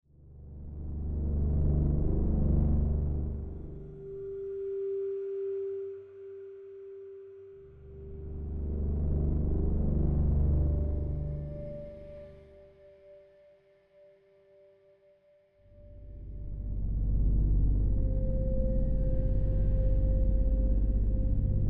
Creakings; Fear Effect Sedna

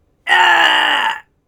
Male Death Cry

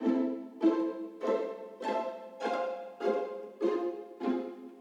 Explore Alt Beginning Intro; Ghostbusters

